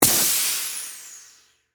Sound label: Air Release Valve, Industry, Air, Release, Air, Compressor, Valve; Air Pumps